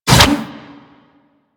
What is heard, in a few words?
Scythe Spin Whoosh; Weapons; Stalker; Warframe